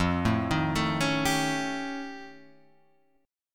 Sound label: F Augmented 9th F, chord